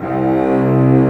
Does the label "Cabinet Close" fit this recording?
No